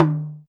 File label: Perc, Alesis DM5; Drums